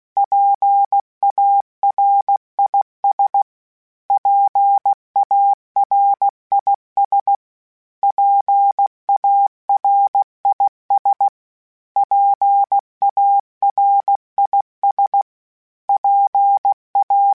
PARIS, Morse Code, Calibration; HamRadio

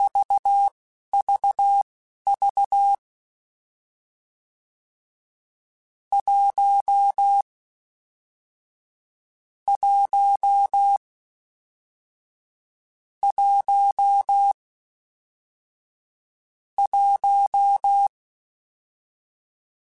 Morse Code, CW Code Groups; Mixed

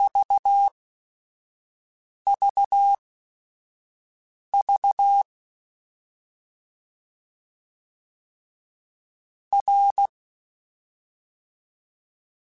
CW Code Groups Mixed Short Runs Balanced; HamRadio

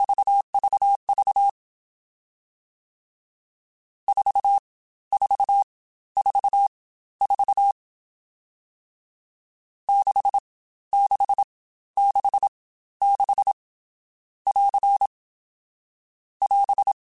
CW Code Groups Mixed Short Runs Staccato; Morse Code; HamRadio

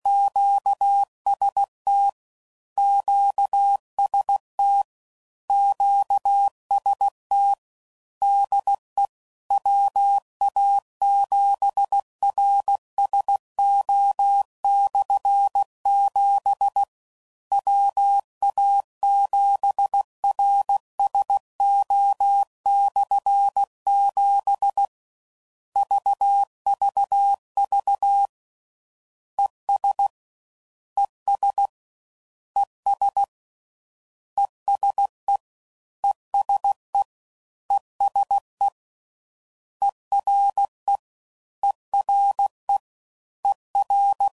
Combinations, CW Code Groups 15WPM; Morse Code

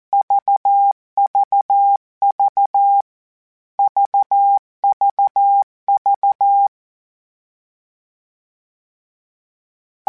All Groups, Morse Code; CW Code Groups; All Groups Concatinated Blocks; HamRadio; Basic Sets

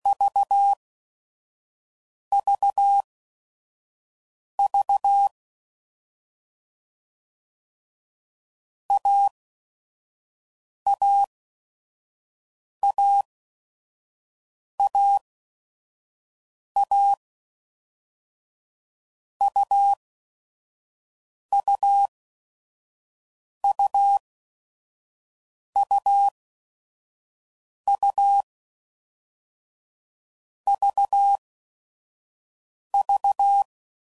AUV AUV, CW Code Groups 5WPM; Morse Code; Difficult Combos; HamRadio; Mixed; Combinations

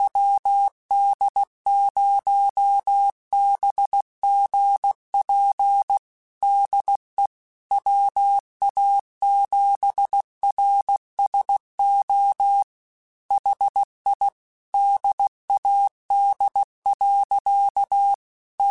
Morse Code, QSOs

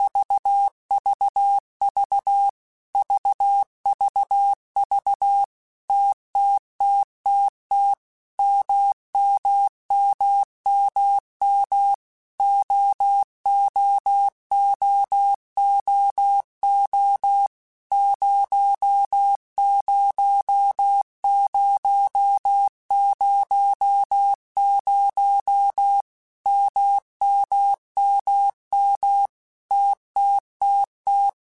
TMO0, Morse Code; CW Code Groups; Basic Letter Groups By7Groups; HamRadio; Basic Sets

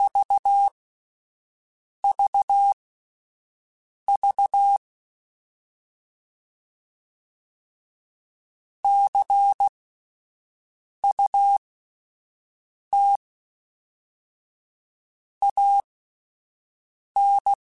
Word Pairs, Morse Code; HamRadio

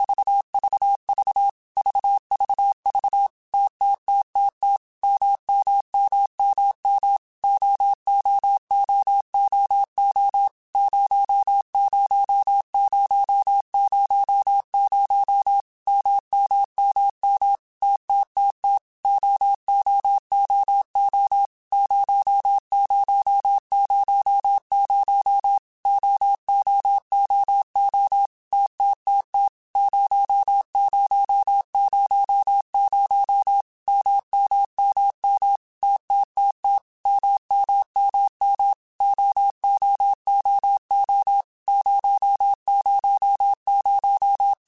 TMO0, Morse Code; CW Code Groups; Basic Letter Groups; HamRadio; Basic Sets

